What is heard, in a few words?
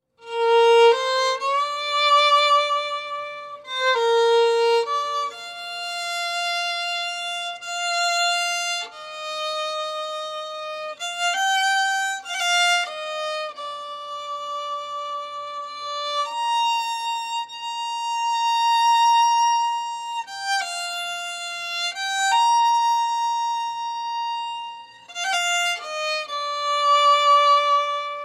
Jig Of Slurs, Scottish Music